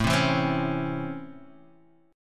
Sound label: C sharp Minor Major 7th Sharp 5th, chord